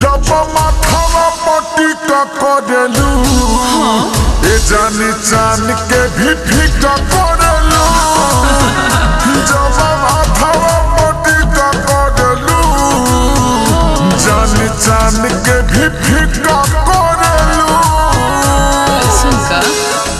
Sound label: Ringtone, slowed and reverb